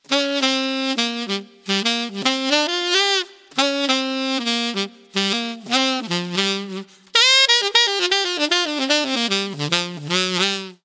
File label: tenor saxophone